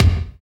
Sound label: BD, BD Dance; Sound & Vision; Gigapack I CD 1